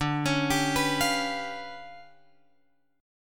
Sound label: D Minor Major 13th